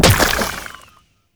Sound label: Shrimp Lantern Destroy; Creatures; Baliq